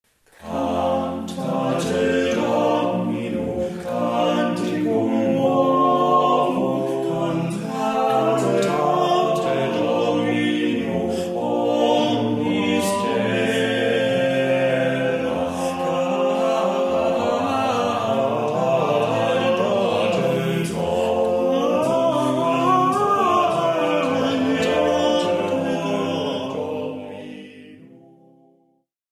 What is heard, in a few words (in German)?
Cantate; Hassler